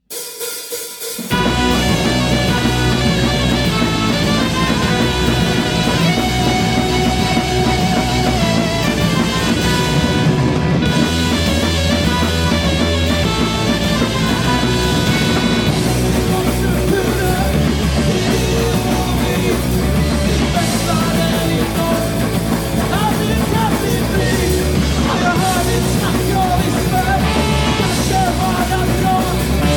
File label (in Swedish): käften sax